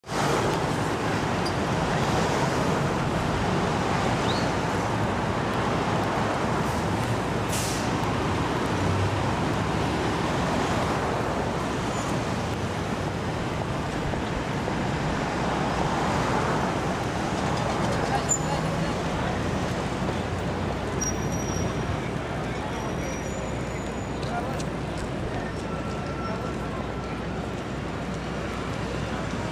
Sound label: traffic